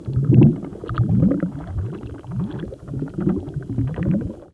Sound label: underwater; quake2